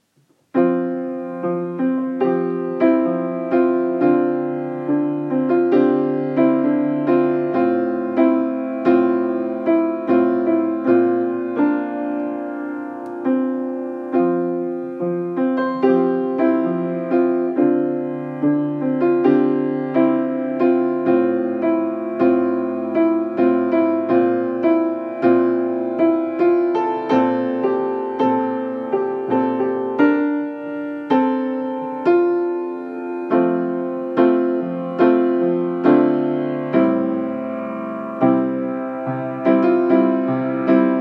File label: Gaveau, piano; Magnifique piano GAVEAU en noyer clair, avec placage en éventail sur panneau du haut et du bas Clavier ivoire de 88 notes Sourdine à main